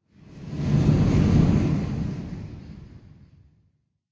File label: cave, ambient; minecraft